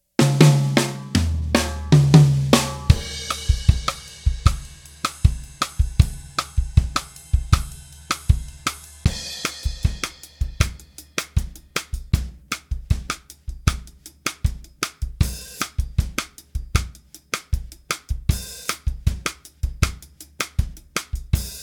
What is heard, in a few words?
bossa nova